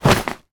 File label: foley bag ruck sack put down; zapsplat